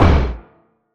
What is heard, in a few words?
Orb walk; Cruelty Squad